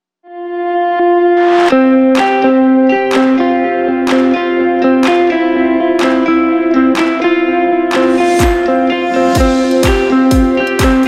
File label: Upbeat Inspiring Indie pop corporate backing uplifting